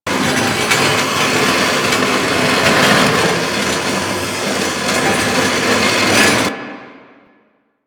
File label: Knee Slide Loop Metal